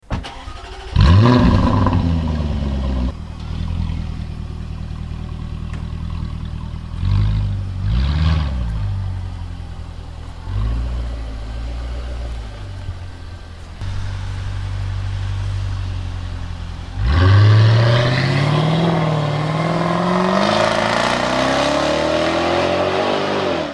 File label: Jaguar F Type